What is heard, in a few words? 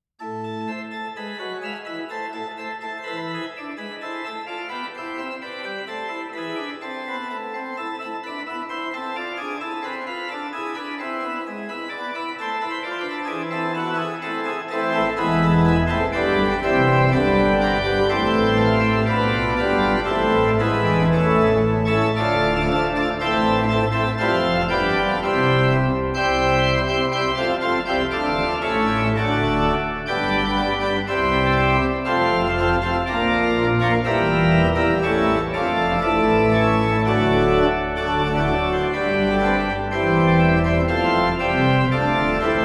Reis Mixtures; Rabstejn